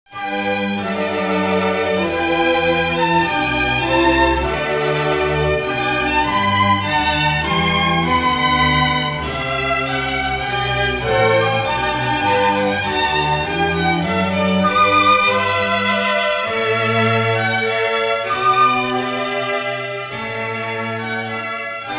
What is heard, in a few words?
Classical Baroque